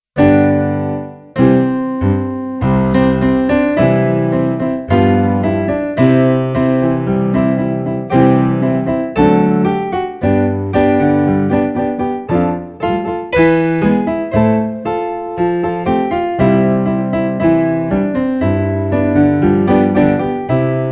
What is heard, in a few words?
Hymn instrumental